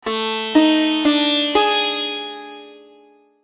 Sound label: jingle; maoudamashii